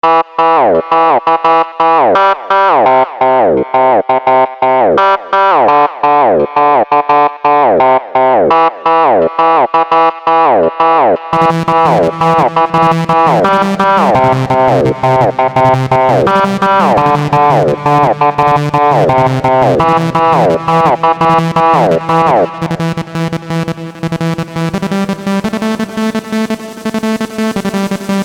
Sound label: Hardstyle